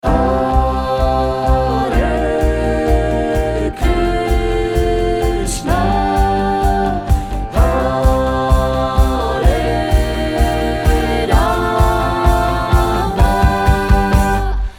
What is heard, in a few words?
Pre Jingle